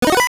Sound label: level up